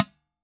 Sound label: Iron Bucket, IR; Claustrofobia v1.1; Iron Bucket 2 mono